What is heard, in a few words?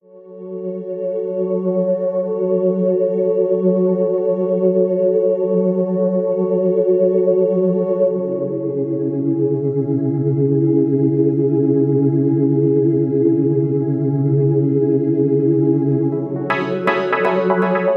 Underscore Synths